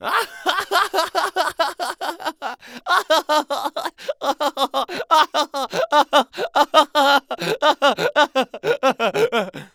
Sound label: Human Male Laugh Boy Laugh Voice Long